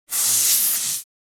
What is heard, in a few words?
Steampunk machinery compressed air release hiss